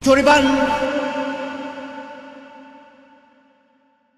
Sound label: reverb